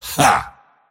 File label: mars laugh; dota